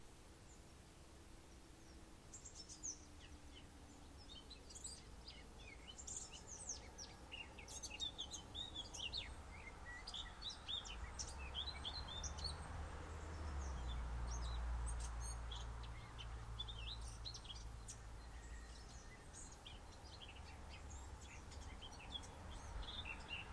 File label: birdsong